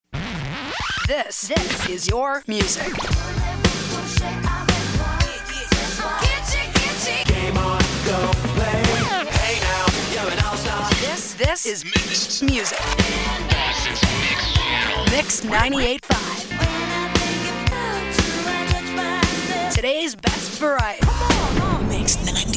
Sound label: Beat Mix Promos